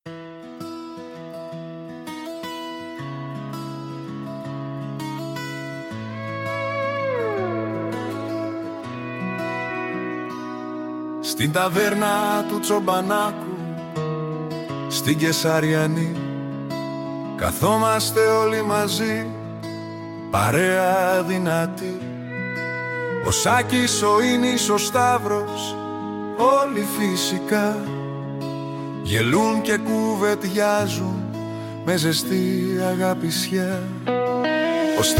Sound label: nostalgic uplifting emotional